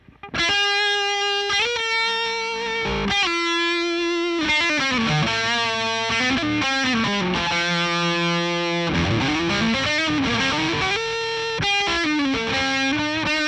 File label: les paul lead; egnater